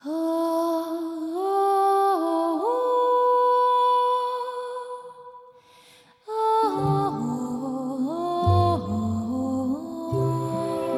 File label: Ending; Fantasian Neo Dimension; PS4 PS5 Switch Windows Xbox Series XS gamerip 2024